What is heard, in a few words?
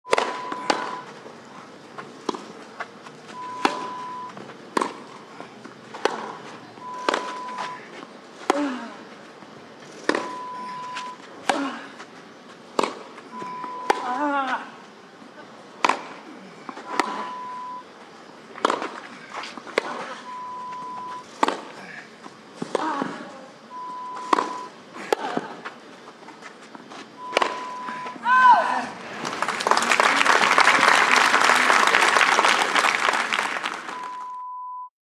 Wimbledon tennis ralley